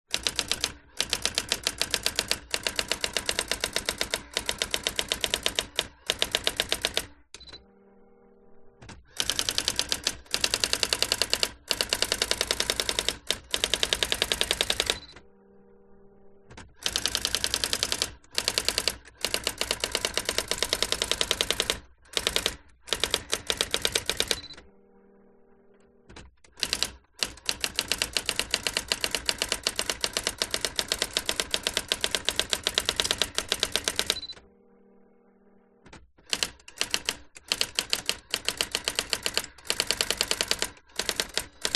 electronic typewriter typing fast sequence brother ax 450; office